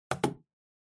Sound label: Cell Phone Hardware Put Down Table